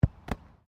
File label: SOCCER BALL BOUNCE CATCH GRASS; Soccer ball sounds; Soccer (Sound Effects:Sports)